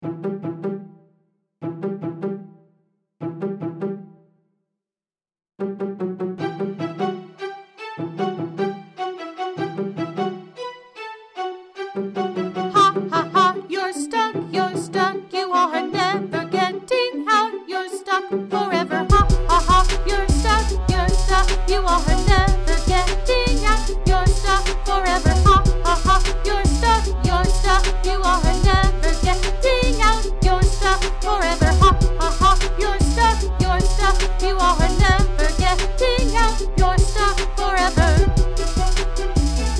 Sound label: song vocals